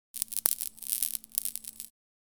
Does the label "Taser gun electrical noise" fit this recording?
Yes